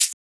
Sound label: Shaker